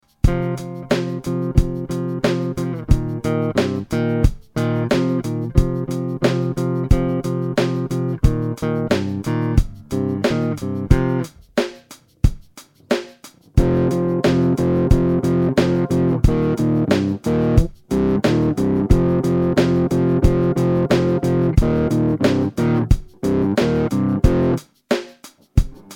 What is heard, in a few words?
dirtbox; trace elliot